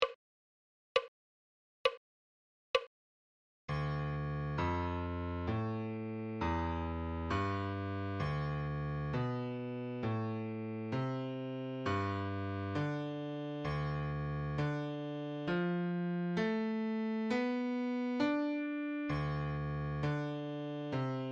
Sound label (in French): Clé de Fa Adagio